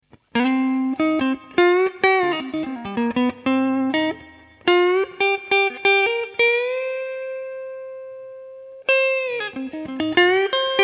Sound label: 60 Neck, telecaster